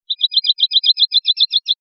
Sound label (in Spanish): rep flin flin, pardillo